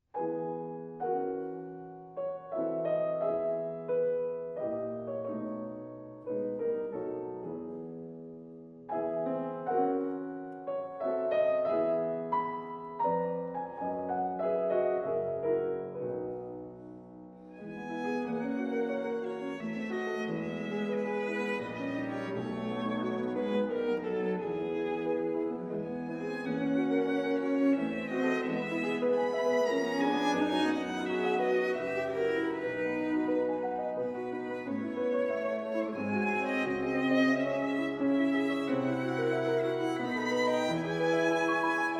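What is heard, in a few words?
piano trio; jadassohn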